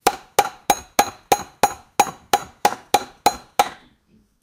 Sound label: butcher cutting through m; beating